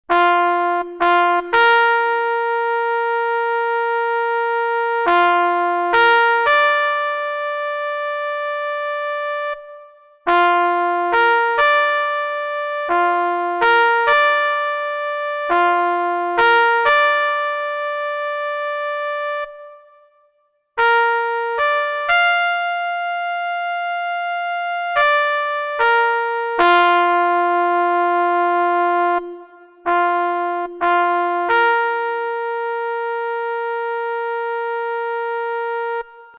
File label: Taps